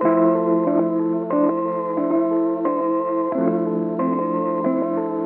guitar, Loops Phonky

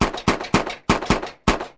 gun shots; Crash Bang Boom

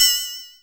bounding, Bells; Misc Percussion

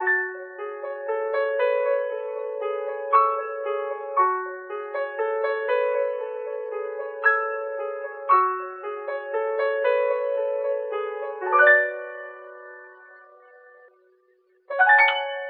piano, Sad Loops

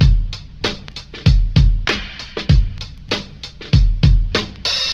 Drum loops Boombap

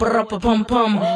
brruppa pum pum; ooh ahh

